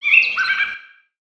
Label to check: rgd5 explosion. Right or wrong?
Wrong